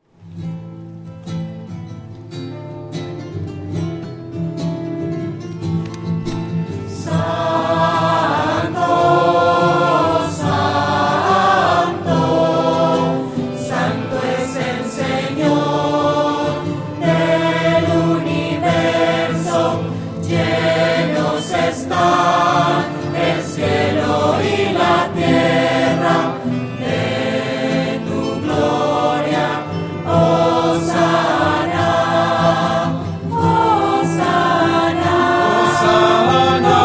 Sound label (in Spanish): santo lento y triste, voces